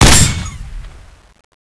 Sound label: nailgun fire